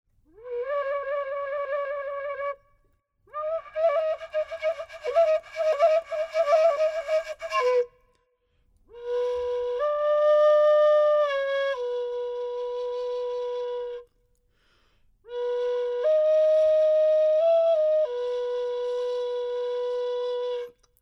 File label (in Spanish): Flauta de barro